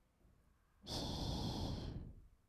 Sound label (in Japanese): ひ, 吐く音, ブレス; ASMR; 1200 kawaii voice!!